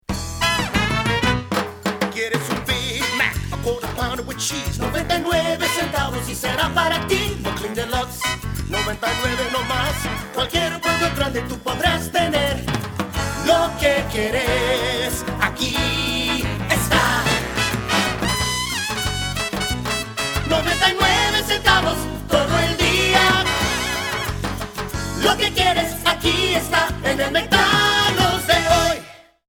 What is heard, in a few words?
Jingle; McDonalds